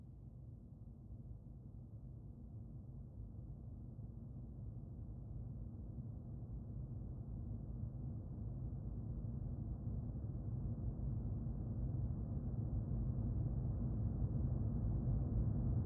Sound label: Long Cinematic Sweep